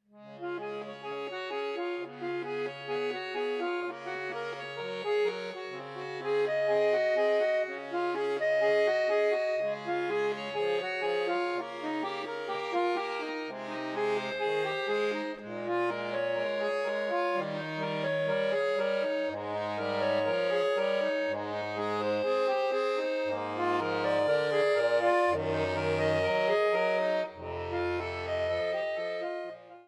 Prelude in C sharp major, accordion

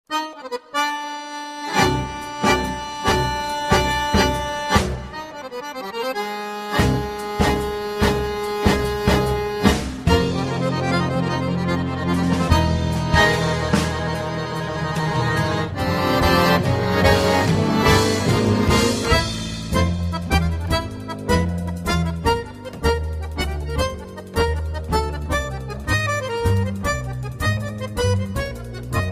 Le Tango, accordion Trio + String Quartet

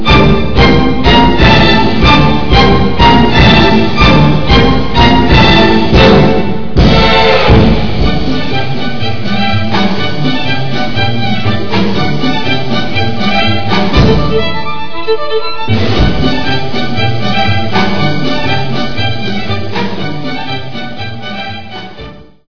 jazz, accordion

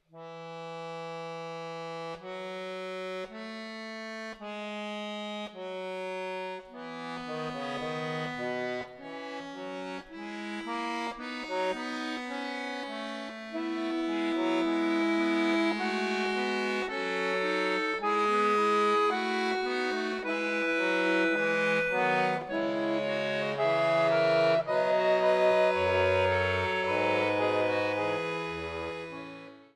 Fugue in E major, accordion

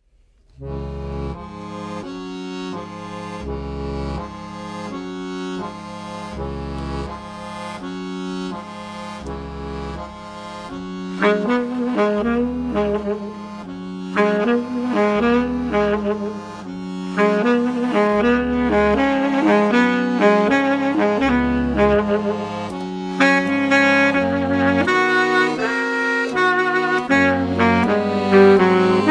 sax akkord, accordion

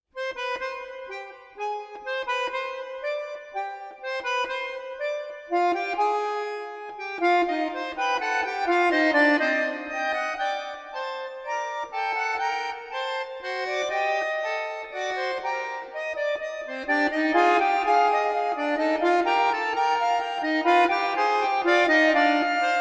Fugue in C minor, accordion

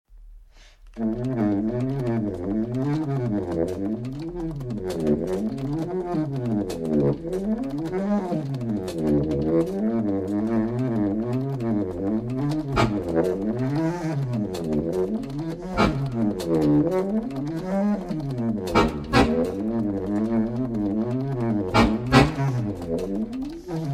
sax akkord, accordion

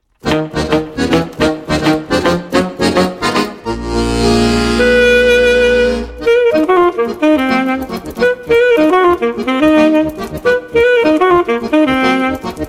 sax akkord, accordion

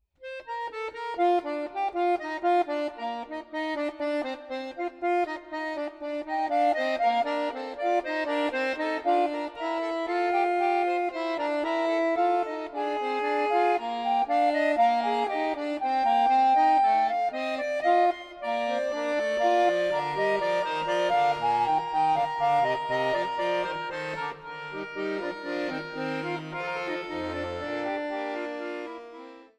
Fugue in B flat major, accordion

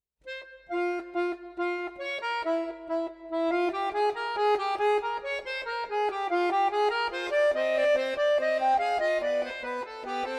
Fugue in F minor, accordion

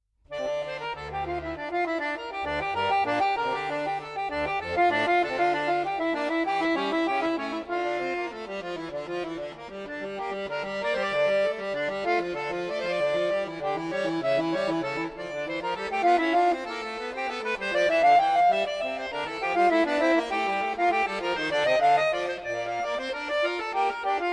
Prelude in D minor, accordion

